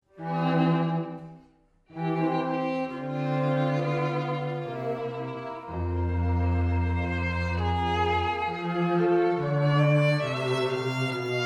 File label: trio